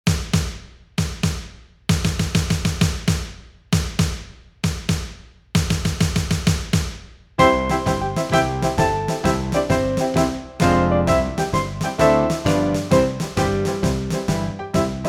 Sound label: Accomp; West Point Alumni Glee Club; Rehearsal Aids